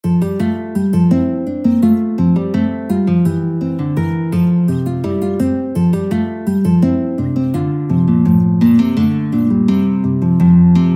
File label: bourree, guitar